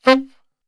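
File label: C saxophone shot, Brass Super Section; Best Service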